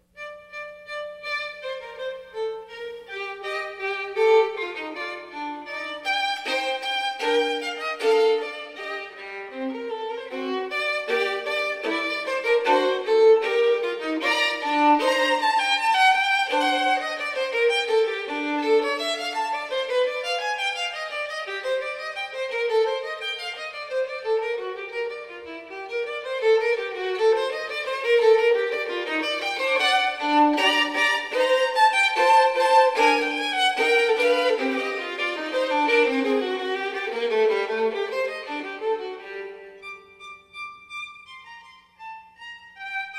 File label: bach fugue